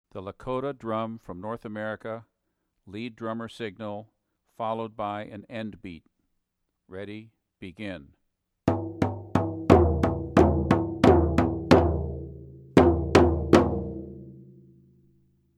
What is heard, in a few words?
lead drummer signal